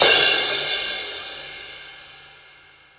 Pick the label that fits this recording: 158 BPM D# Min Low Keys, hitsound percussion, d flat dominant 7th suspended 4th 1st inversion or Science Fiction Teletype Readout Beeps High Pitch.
hitsound percussion